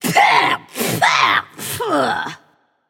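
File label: clear throat, female; smite; voicepack; hera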